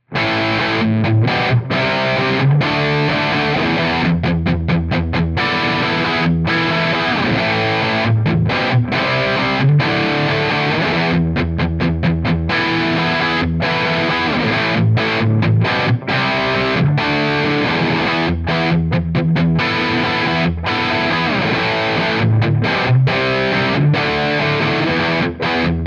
Cond 87 off axis Dyn 57 off axis Room Bathroom 16dB